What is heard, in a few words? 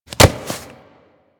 Generic Mantle Foley; Characters; Warframe